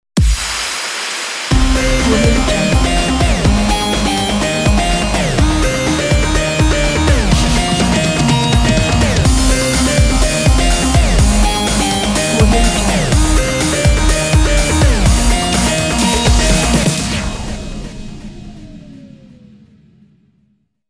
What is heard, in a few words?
fashion ident; kipaloops